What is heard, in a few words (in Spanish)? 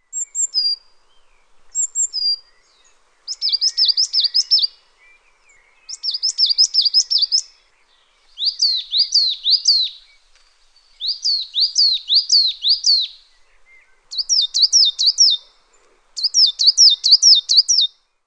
carbonero garrapinos